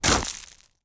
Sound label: foley footstep boot kick gravel stones out